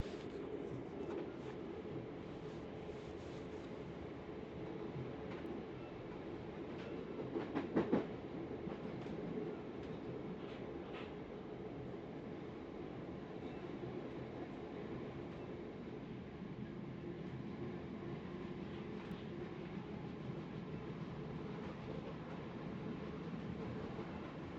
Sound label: singing train between London Bridge and New Cross stations